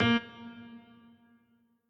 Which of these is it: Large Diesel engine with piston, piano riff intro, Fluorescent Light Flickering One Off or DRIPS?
piano riff intro